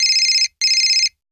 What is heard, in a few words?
phone ringing; soundscrate